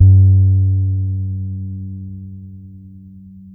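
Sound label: G#3, dark fretless bass; Spectrasonics; Bass Legends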